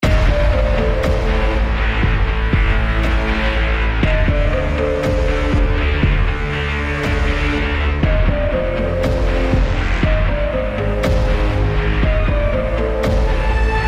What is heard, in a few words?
distorted reality perception